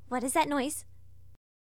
Noise; Hilzartov; Fairy Size Doesn't Matter

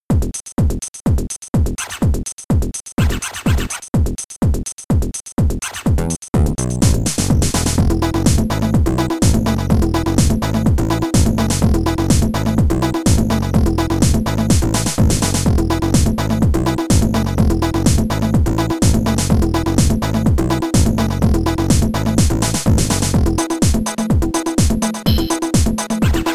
Stage BGM

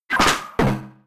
Knock

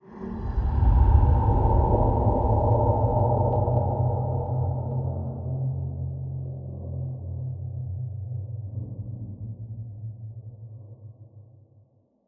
asylum basement stairway; oneshot

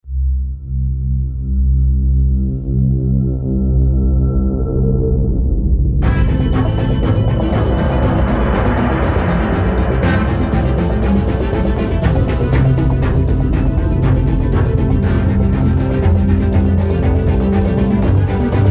Action loop

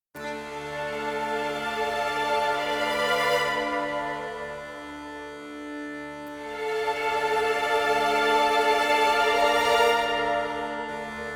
Exploration; Skellige

